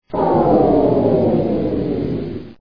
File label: shutdown